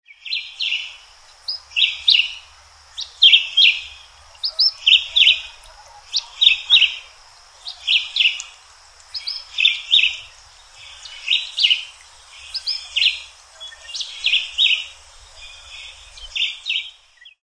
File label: black whiskered vireo